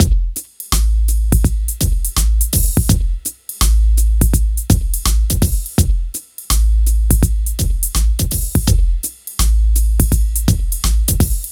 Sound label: DRM, Hip Hop and R&B Drumloops; Best Service; ProSamples vol.01; AKAI